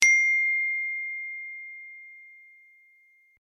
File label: Iphone Loud